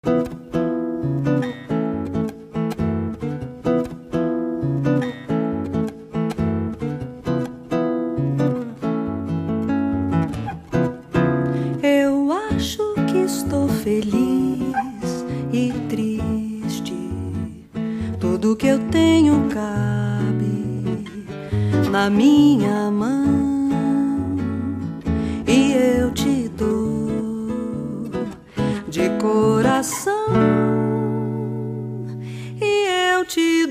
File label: Brazilian Cafe